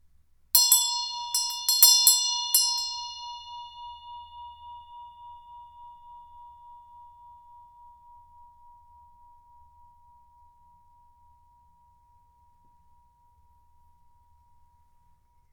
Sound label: tibetan bell